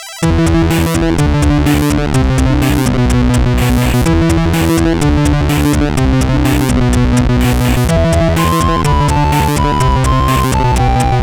Rain

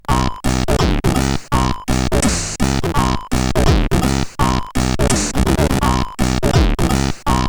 Monster Kick, sid, CSG8580R5

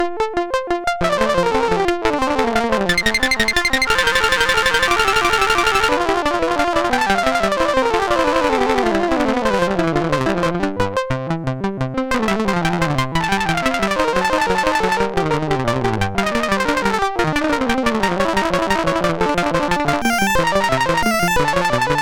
Invention VIII, MOS6581R4; sid

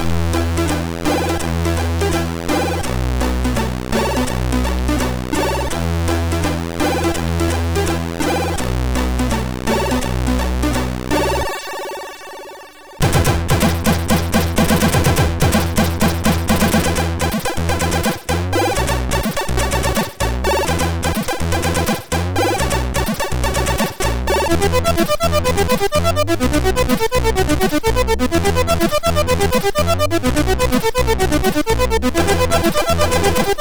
Spinnin Violin, sid MOS6581R2

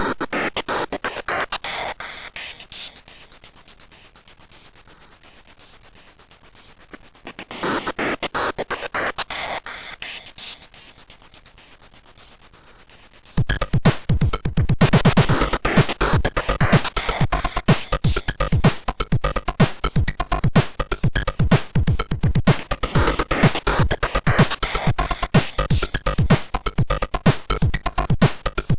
Drums, MOS6581

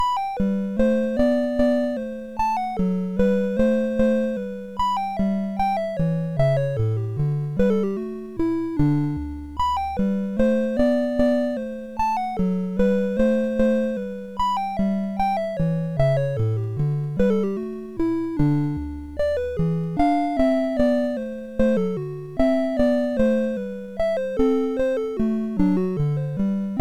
Minuet No1, MOS6581R2

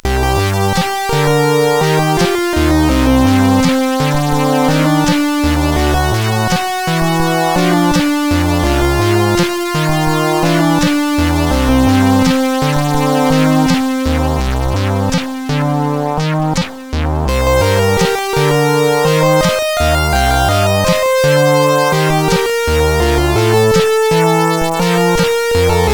Sad Gameover, CSG8580R5; Shade

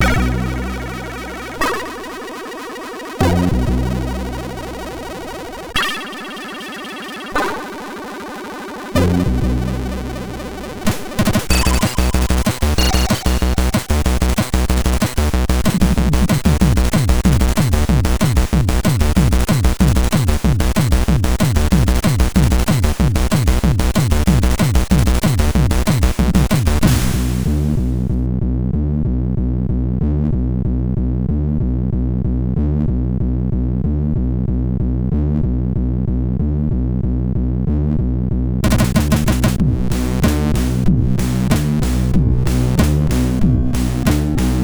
Hardcore, sid MOS6581R4

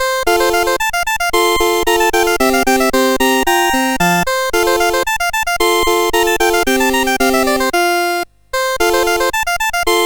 Scherzo Sonaina; CSG8580R5

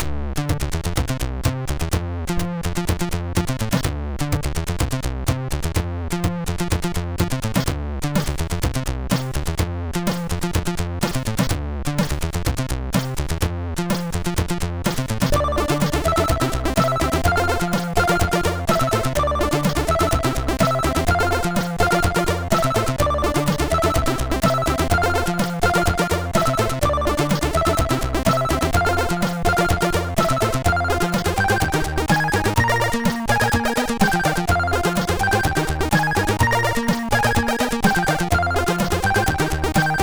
Intro Jam, MOS6581R2

